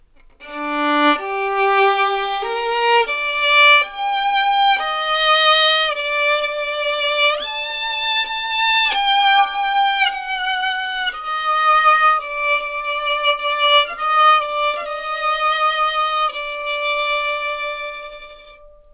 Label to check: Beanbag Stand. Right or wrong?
Wrong